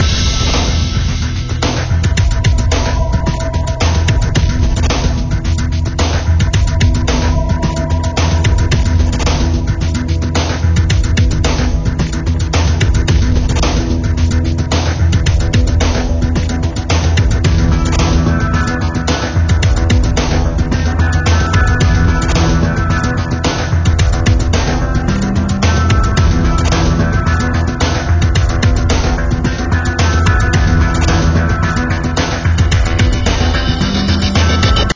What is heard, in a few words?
Tension Loop; Last Hope Tower Defense